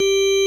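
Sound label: ORG Vox G, Continental organ; Continental2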